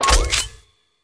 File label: menu open; weaponweights content